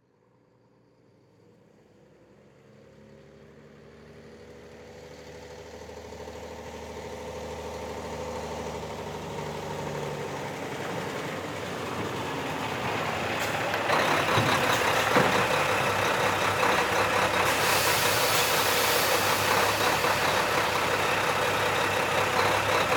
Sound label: Semi Truck Mac; Blastwave